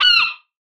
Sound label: bird caw; triple-aaa-games